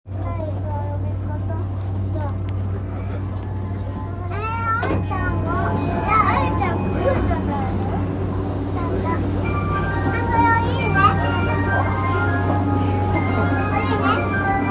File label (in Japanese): JR山手線浜松町CW